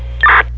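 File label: push-to-talk, radio effect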